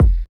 smooth kick single hit F key, Royality free kick sound tuned to the F note; kick drum samples